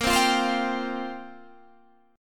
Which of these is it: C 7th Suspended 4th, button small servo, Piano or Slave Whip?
C 7th Suspended 4th